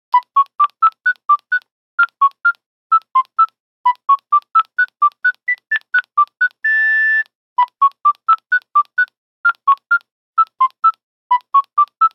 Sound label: Nokia Buzzer; Windows Phone apps; 9210 Peer gynt